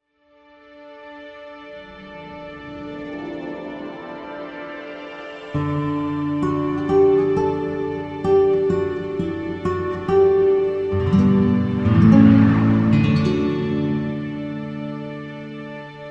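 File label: Music Logo Classical Guitar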